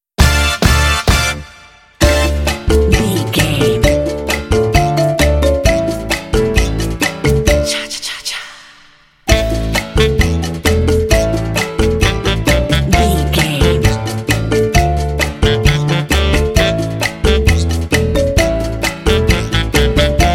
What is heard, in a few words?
cha cha cha